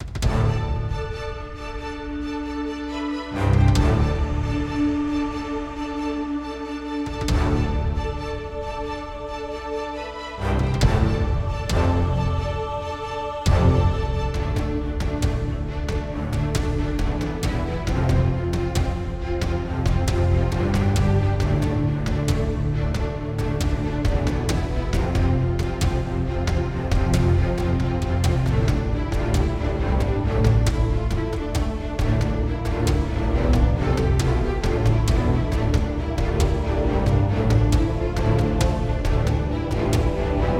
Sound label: Battle